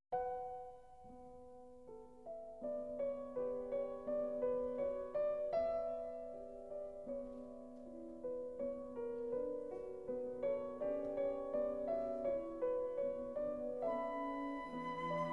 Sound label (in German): Klavierkonzert Adagio; GTailleferre